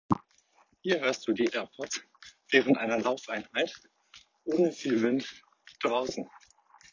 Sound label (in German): AirPods Pro beim Laufen ohne Wind